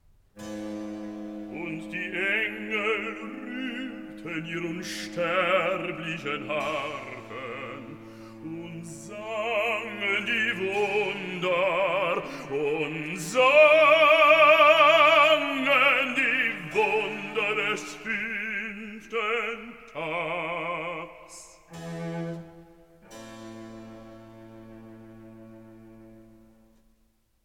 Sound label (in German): Rezitativ; Und die Engel rührten ihr' unsterblichen Harfen (Raphael)